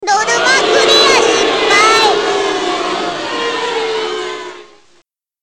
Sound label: failsound; Taiko AC0 v4